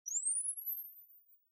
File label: Camera Disposable Flash Charge